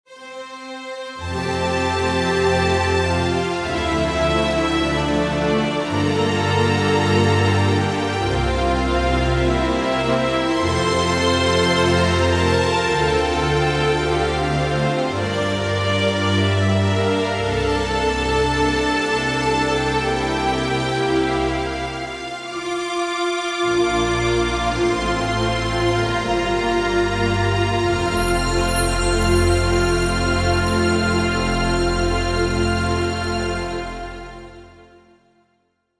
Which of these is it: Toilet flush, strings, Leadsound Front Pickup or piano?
strings